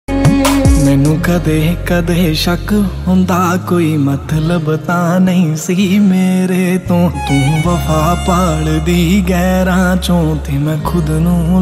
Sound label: song ringtone; adaa khan